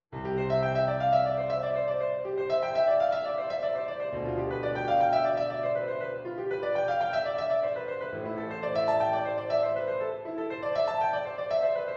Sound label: Prelude